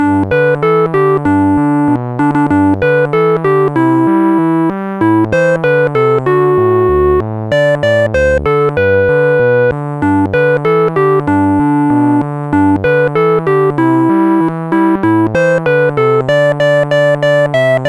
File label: jingle; Sonix Music Driver